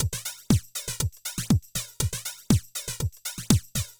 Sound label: House Drum Flanger